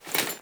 Foley Action Default Melee Swipe, Default Clothing; Characters; Player